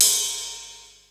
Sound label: Ride, Cymbals; Akai XR-10